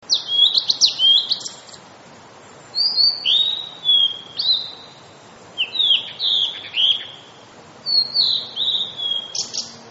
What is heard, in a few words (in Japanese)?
oruri